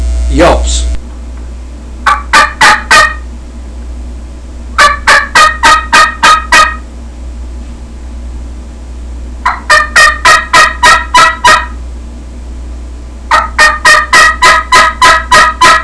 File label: yelps, turkey